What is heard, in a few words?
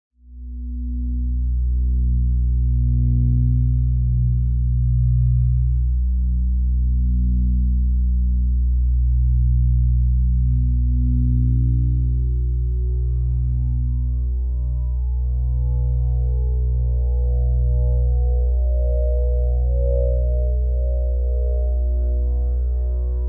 ambiences analogues